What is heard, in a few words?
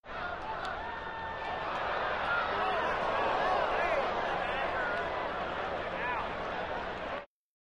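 Arena Crowd Small Ohs; sports arena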